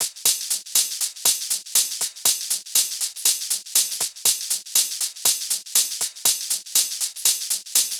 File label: Hh Sh Loop 120; Equipped Music; Loungin House